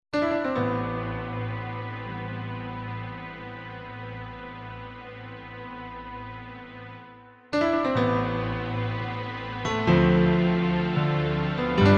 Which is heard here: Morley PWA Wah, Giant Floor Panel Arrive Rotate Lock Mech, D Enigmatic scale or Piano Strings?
Piano Strings